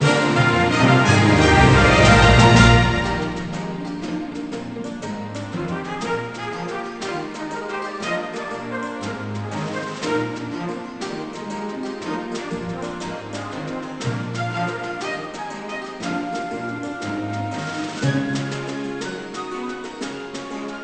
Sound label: Victory Music